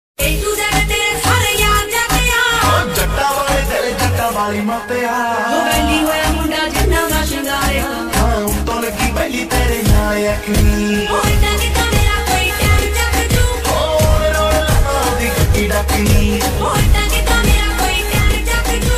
new ringtone punjabi ringtone hindi song ringtone love ringtones mobile ringtone